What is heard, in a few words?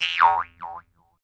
vintage big boing; Cartoon Sound Effects Kit